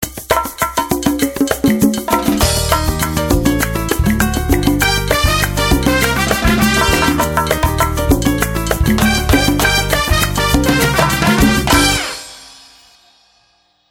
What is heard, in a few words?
Latin, Ethno Radio